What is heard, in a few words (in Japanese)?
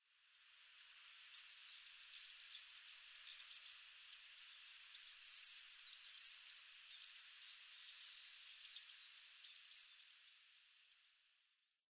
hoojiro; kyohto